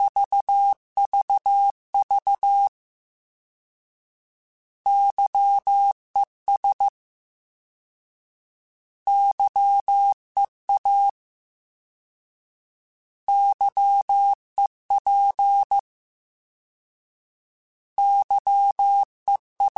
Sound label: Morse Code, CW Code Groups; HamRadio